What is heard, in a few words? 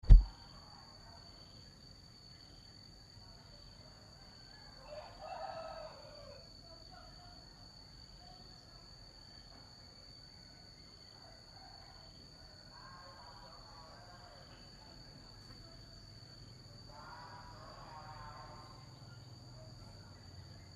Ambience morning, Vietnam; 2019